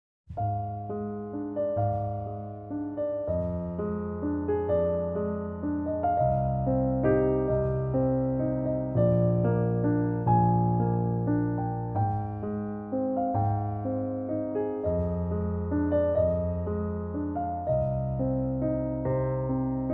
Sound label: piano sad french love; archibaldeditions